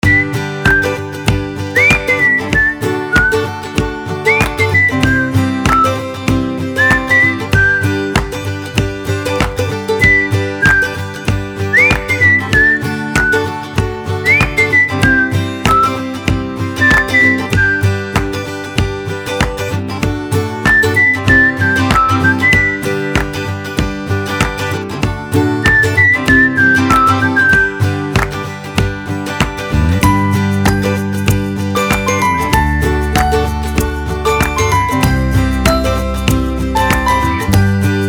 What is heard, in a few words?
Folk Whistle